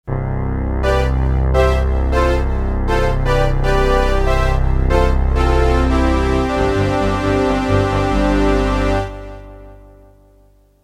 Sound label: RS370, Polyphonic harmonic generator